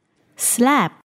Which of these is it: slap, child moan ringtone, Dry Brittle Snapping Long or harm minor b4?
slap